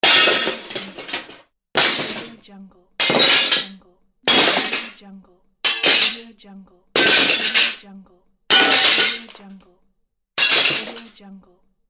glass smash; davidbeardmusicproduction

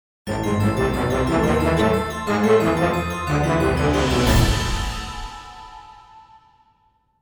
spooky halloween logo

